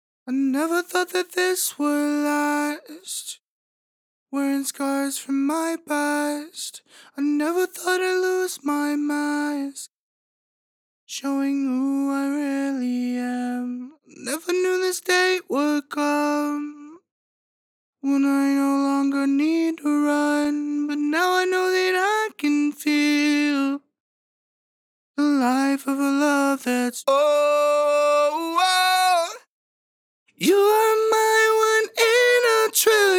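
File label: Harmony Left B minor 140 bpm Dry, Male Acapella; Ghosthack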